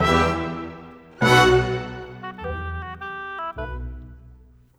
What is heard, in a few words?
Orchestra